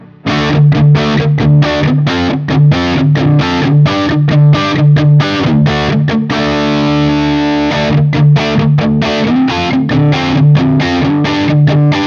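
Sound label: Guild Bluesbird 90 JCM800 D, guitar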